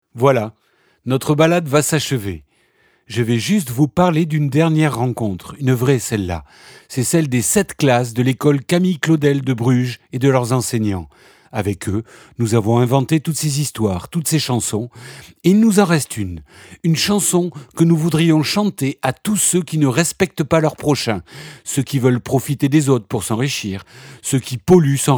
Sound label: transition